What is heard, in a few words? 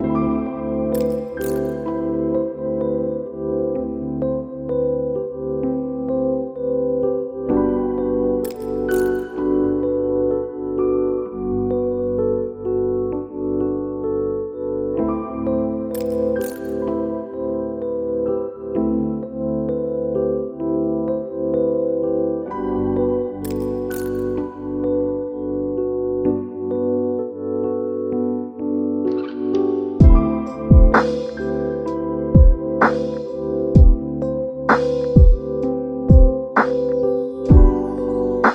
sleepy